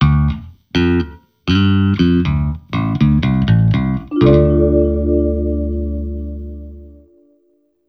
Jingle; Elevator Action Deluxe; PS3

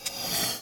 Gaz loop strat

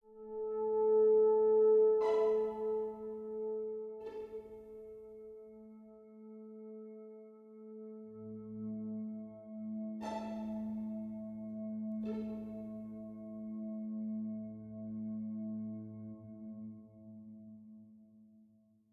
ambiant

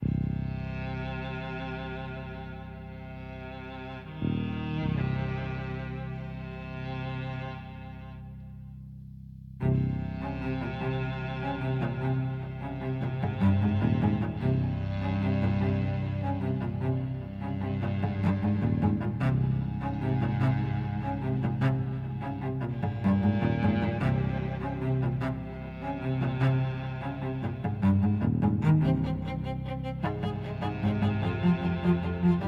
Action Desperate Escape 100bpm Low No Perc; gamerip

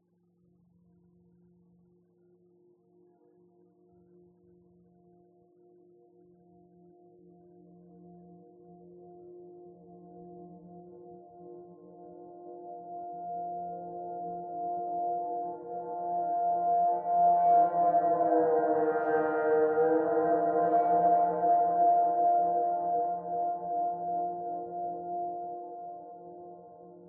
rocky landing music; A Collection of Bad Moments

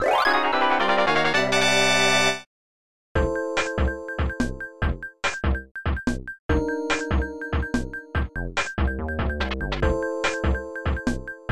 Level Up; Mini Yonku Let's Go Power WGP 2; SNES

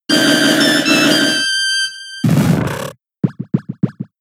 Grass Whistle; Pokemon; gen 6 attack moves; XY ORAS

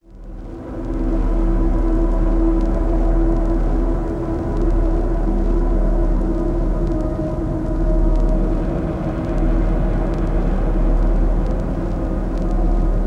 Ui Menu Ambient; The Evil Within; PS3, PS4, Windows, Xbox 360, Xbox One

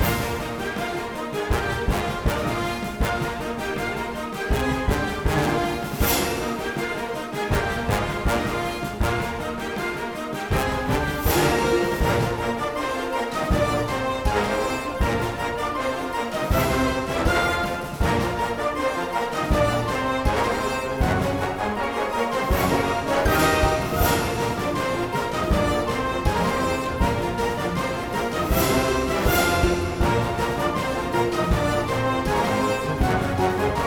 Music Fury Theme Bpm160 Loop; asterix obelix slap them all 2

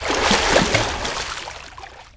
Respawn

